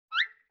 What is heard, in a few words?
Violin Short Pitch Slide; Vintage Cartoon; Musical Elements